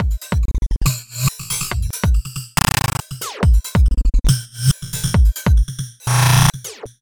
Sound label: Glitch beat